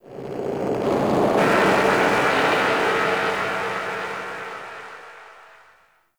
Mid Texture, ambience; Warframe; The New War; Zariman

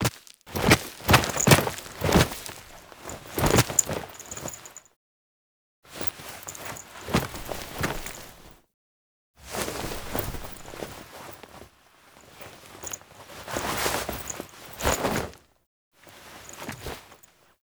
Intro Foley; Cinematics; Archon Death; Drifter

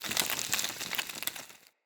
Impact Burn Medium Flesh; Weapon Impacts

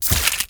Arch Gun Primary Beam Impact Flesh; Weapons; Warframe; Archwing; Hildryn

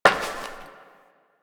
Step Glass; Warframe; Koumei

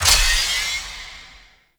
Lantern Power Up; Warframe; Emissary Season Two

